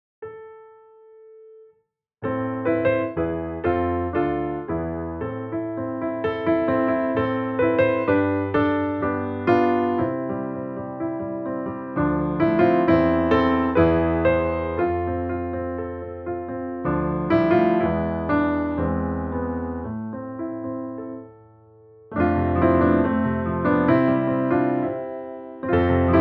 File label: Piano Only; Young Cosette